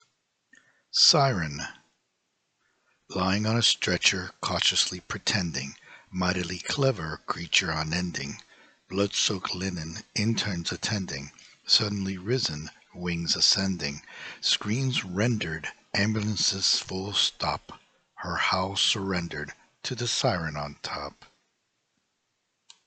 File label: siren